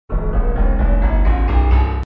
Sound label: doremi